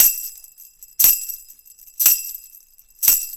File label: Roots Reggae; Sounds Good